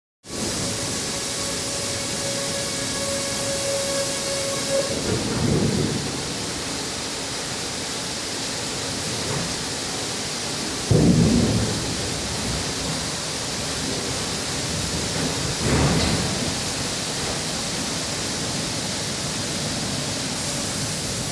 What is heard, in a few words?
Saw Mill Ambience Power Saw Cuts Logs Engine Noise Logs